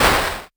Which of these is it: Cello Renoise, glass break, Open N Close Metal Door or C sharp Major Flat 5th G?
glass break